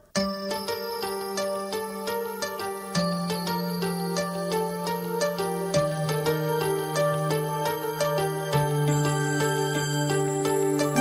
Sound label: Flute Tone